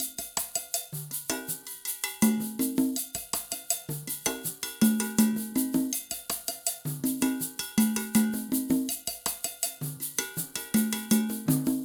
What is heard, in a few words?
Perc mix, acoustic percussion loop; Drums & Percussion; Percussion Loops